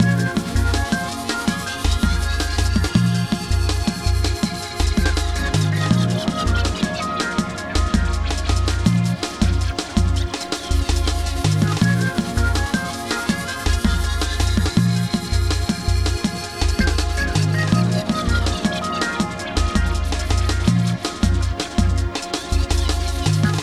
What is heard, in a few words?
mix, Drum & Bass; Best Service ProSamples vol.03 - Drum & Bass 1; AKAI